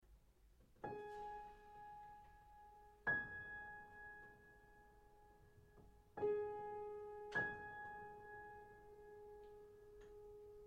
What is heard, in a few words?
ravel vallee cloches